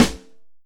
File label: Acoustic Snare